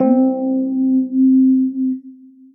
neo soul glide pad; Synth Pad; Ableton; Chop and Swing

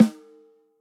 SNARE Low stick center

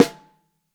SN3 Top, snare; Ableton Foundation FreePacks; Snare Designer

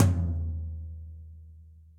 Tom Multirod Floor Multirod Dry; Drums; Drum Hits; Ableton; Session Drums Studio; MPE

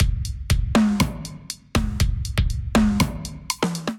Kit, Drums Acoustic; Exposed Kit

